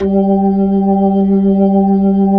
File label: B-3, Jazz